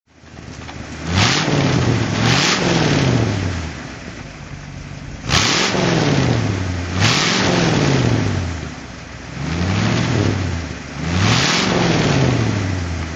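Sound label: facel hk500 throttle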